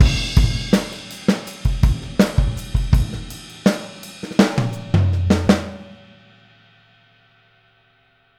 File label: Boogaloo - Outro - Full Mix, 82 BPM